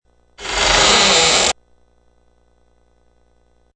High pitched slow creepy creak as a door opens.; Miscellaneous Doors

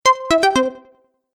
Stage Level Complete; Video Game Sound Effects

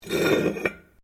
METAL ON STONE SCRAPE; Metal (Sound Effects:Materials)

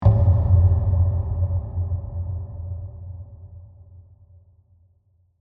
Hit Impact Dark, Low; BlastWave

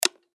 Button Switch

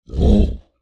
Creature Large Grunt Short; Monsters; Sound Effects:Horror; Big Room Sound

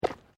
Footsteps Boots Ceramic Paving Tiles Step; Footstep Foley; Human

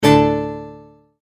Multimedia Tone-Stop

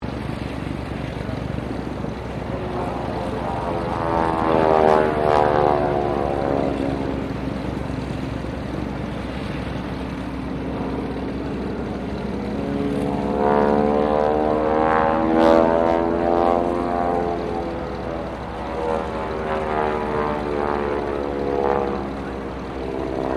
AIRCRAFT UNKNOWN PROPELLAR SQUADRAN PASS; Airplanes; Vehicles

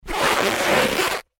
Foley Balloon Rub Short; Balloons; Cartoons

